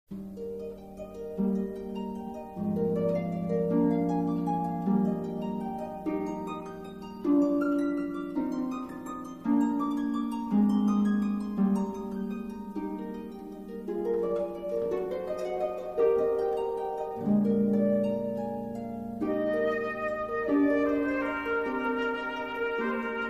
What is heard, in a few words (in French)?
Harpe et flûte